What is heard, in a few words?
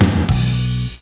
gam lose